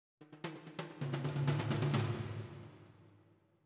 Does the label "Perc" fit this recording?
Yes